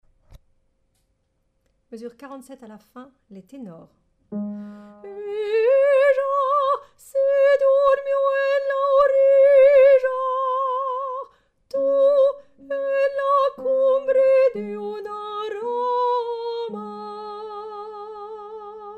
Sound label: paloma Tenor